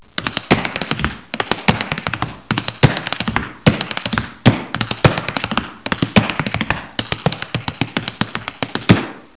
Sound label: tap